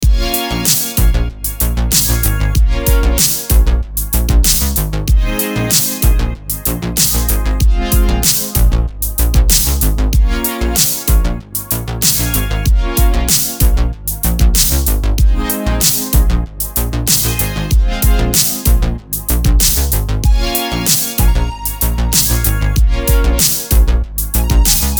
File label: retro dark synthwave